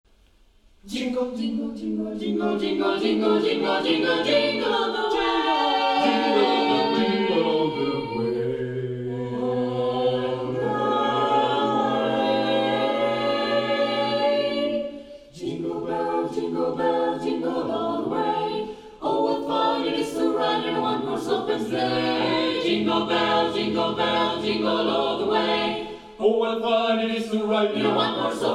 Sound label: Jingle Bells, swing